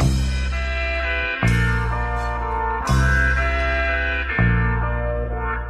keys, Phonky loops

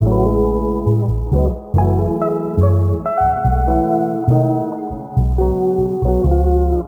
guitar smooth, Phonky loops

